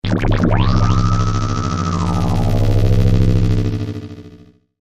UFO Bean Ray, Scifi, Ufo, Bean, Ray, Vintage, Science, Fiction; Spacecrafts